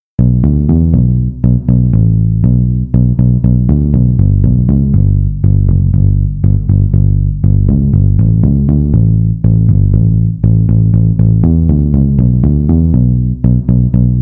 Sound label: pick bass